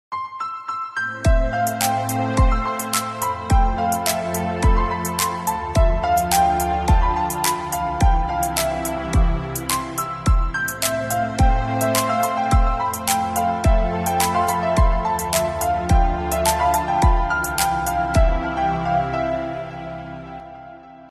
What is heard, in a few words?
Mobile Ringtone